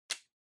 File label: Portable CD Earphones Unplug